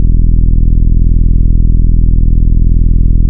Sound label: Root E, Bass